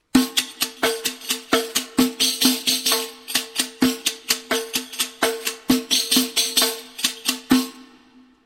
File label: riq